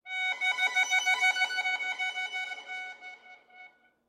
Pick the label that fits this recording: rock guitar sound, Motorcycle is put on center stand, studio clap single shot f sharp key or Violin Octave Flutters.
Violin Octave Flutters